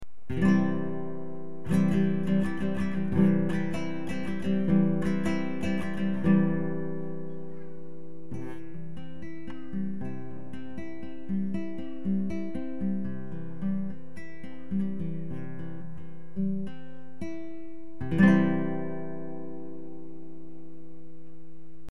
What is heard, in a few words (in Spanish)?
tocando acorde do, guitarra